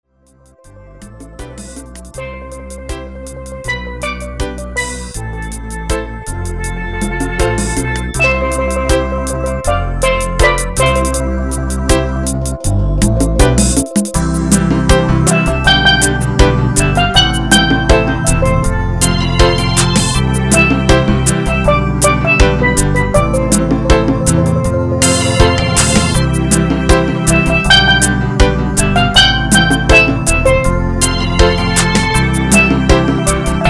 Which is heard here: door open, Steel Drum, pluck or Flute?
Steel Drum